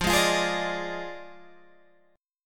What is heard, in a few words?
F Minor 13th, Guitar Open G